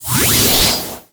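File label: Summon Synchro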